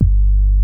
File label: MOOG MID G2, ANALOG BASS; Music Store - Claudius Diemeter Schwerlos